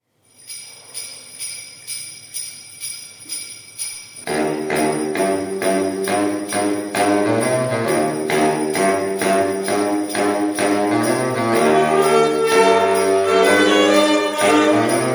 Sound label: Saxophone Ensemble